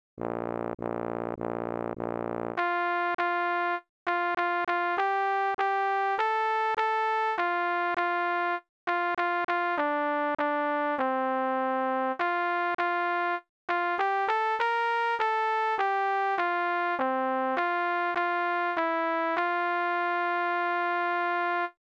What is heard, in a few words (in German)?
Sopran; Blaeser